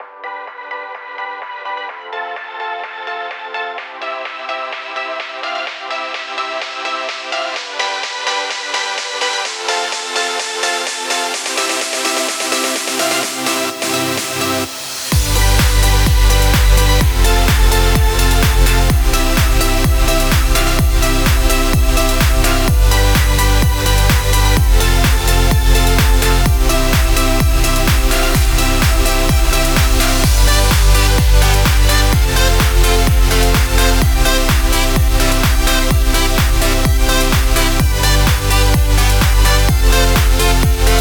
positive pop

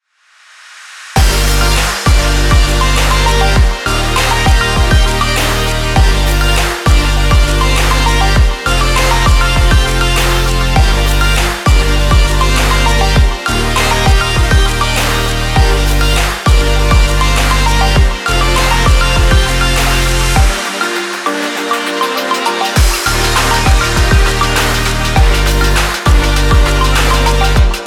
uplifting summer pop future bass

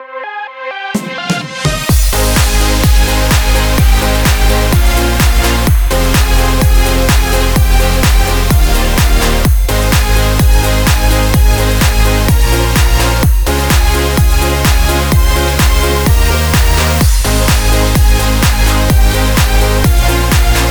positive inspiring dance

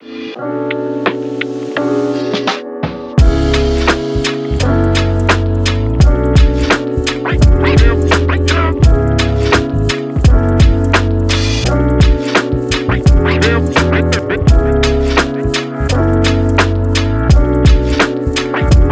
sweet vlogging

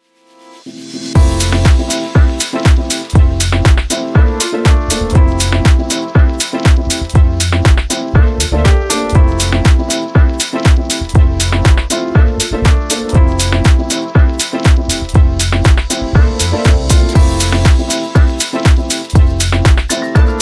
street melodies

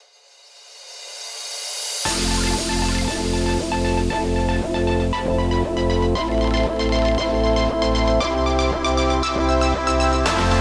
universal background pop

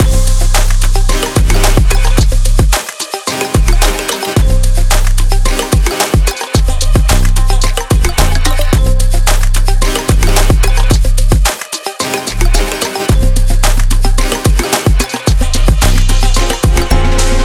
dynamic impulse loop

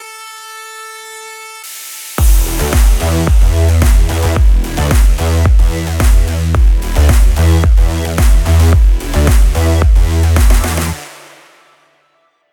drive sport

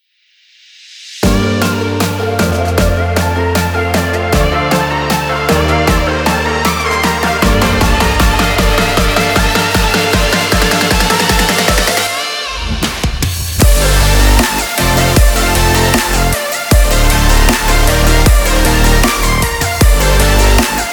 future bass

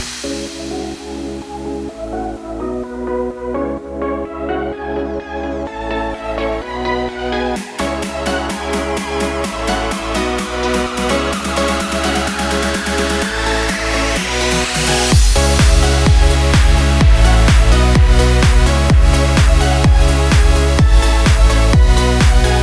positive upbeat dance pop loop

